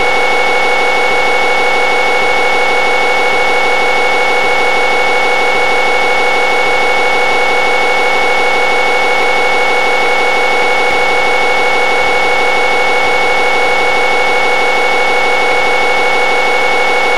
minigun spin long; weapons

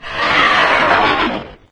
die; doom3; archvile

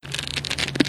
pull; bow

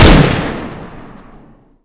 negev, mg42; weapons; dod

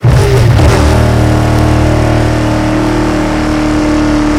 shelby shift down

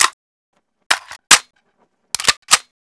bar world reload, m1918; weapons

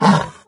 pain, doom3 pinky

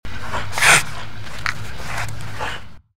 gecko vox; npc

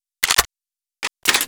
ice reload

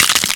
bones snapping

